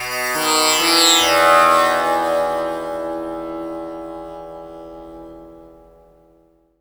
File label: TAMPURA; Spectrasonics; Heart of Asia